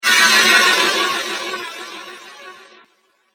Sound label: Glassy Whoosh Transition; Whoosh Sound Effects; swish, sweep and swoop sounds